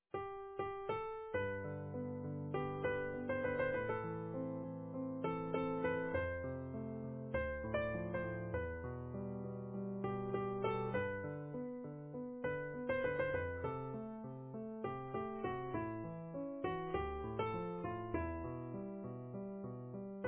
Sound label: Easy Piano